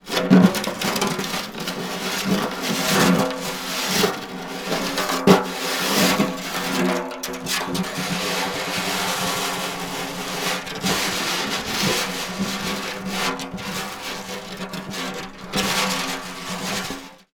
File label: Snare Drum; Percussion Samples